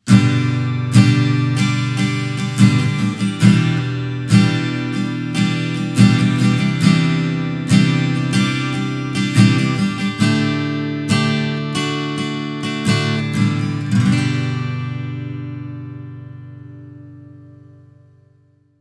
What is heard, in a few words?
STRUM